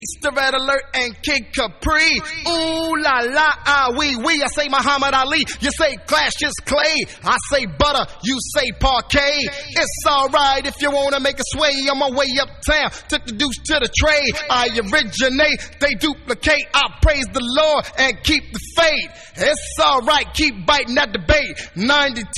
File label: Acapella